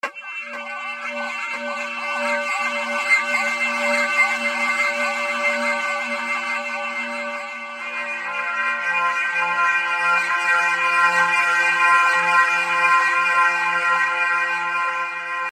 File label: spoooky wierd sound; Chuck's Zombie Petting Zoo; Marr0w Productions